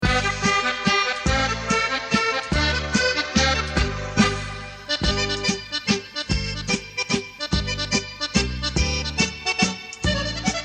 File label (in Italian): Mazurka tirolese